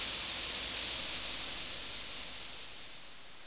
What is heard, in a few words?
melt